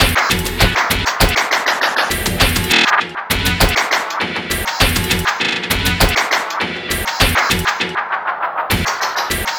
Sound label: 8 Bit Beat, Modular Electronica; Beats; Sonic Foundry (Sony Creative Software)